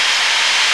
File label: turb, airplane; akustik